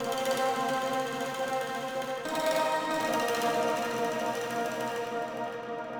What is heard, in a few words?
ethno, 160, C